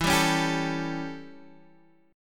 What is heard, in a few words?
A 7th E, chord